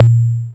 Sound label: A#, Synclav FM PERCUSSION; Big Fish Audio